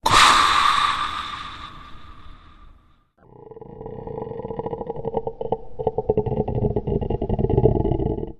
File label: Spacecraft Hatch Opening, Sound of a spacecraft hatch opening.